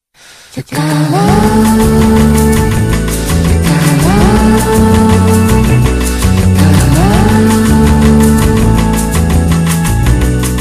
elevator; cave

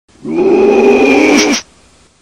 doof; cave

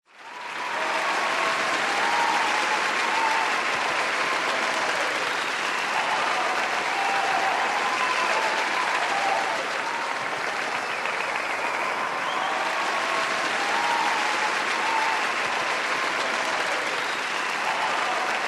applause

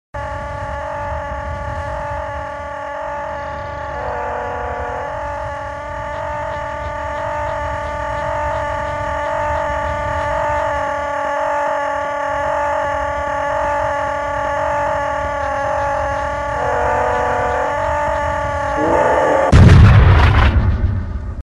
aaaa

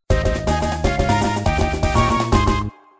oh well music; cave

